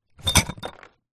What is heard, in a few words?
broken glass in cardboard box movements; zapsplat